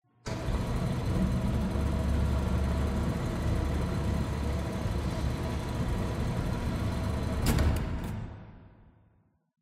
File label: molding conveyors; Poppy Playtime